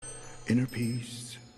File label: panda selection